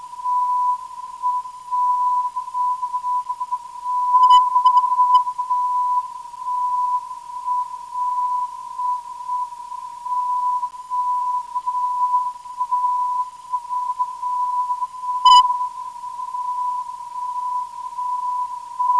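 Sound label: TLO 368.96kHz, non-directional beacon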